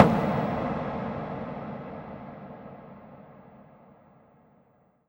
FX Impact

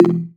Percussive

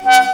Synth Shot C#; Mutekki Media; Vengeance Electroshock Vol.2

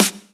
Claps & Snares

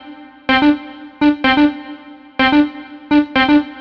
126 C D music, Funky Underground; Loopmasters; Funky Underground Vol 2

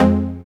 TECHNO C3; Jack Sparrow Deep Dubstep Progressions

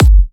Bassdrum

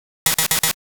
123 Em Sfx, Complex Electro Synths; Prime Loops; Onyx

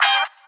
G STAB, funky; Loopmasters; Funky Underground Vol 2

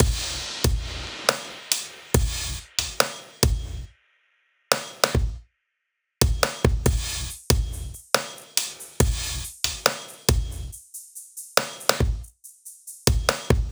Leaf Break, Bpm 140, Dubstep, Drum Loops

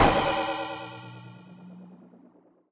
Ride, Dubstep One-Shot Drums

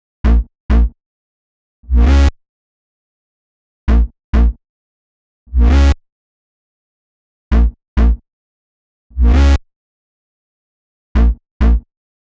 132 Em Bass, Bpm132, Complex Electro Synths; Prime Loops; Ossify

